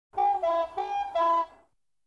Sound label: Diesel Trains Engine horn, two; BBC Sound Effects Library